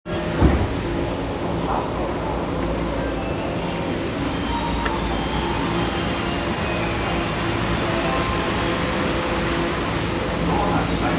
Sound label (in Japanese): 銀座線上野広小路東, train sounds